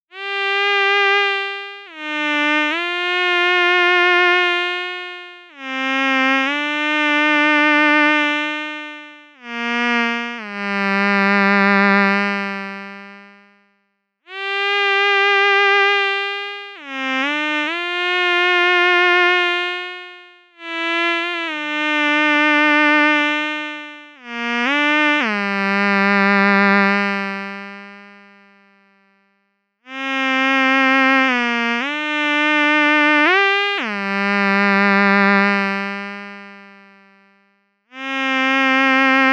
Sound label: MOOG MAVIS Delayed FM Lead Dry Signal